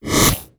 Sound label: Menu Weapon Assemble; Custom UI Sounds; Call of Duty Ghosts